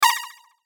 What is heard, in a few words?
Synth Stab Delay High, Bright, Metallic, Hollow, Swell; Production Element, Imaging Element, Accent, Transition; Multimedia; Blastwave